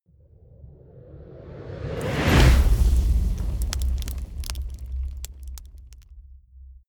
whoosh fire torch ignite

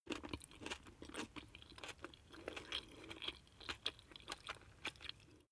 chew spaghetti medium

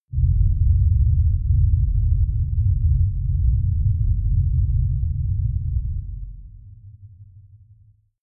avalanche rumble

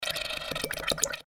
bubble

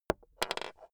chess piece drop

